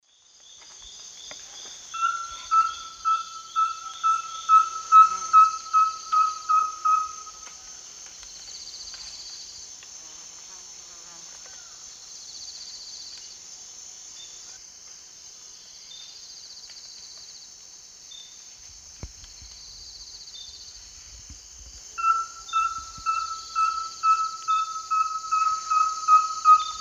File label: Liosceles thoracicus, song; S. America; Eastern Lowlands